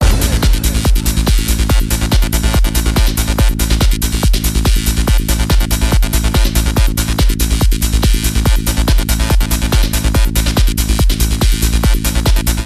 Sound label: Psycho Trance